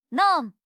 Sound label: teto bites